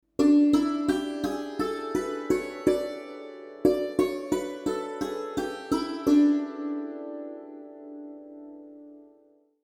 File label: Kedar; murchanas